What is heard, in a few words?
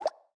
Lagoon Water Bubbleburst; Zombie Catchers